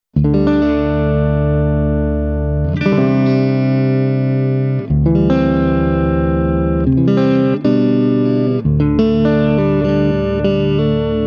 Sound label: Swart Amp; Swart Atomic Space Tone; No external effects - used only the amps reverb and tremolo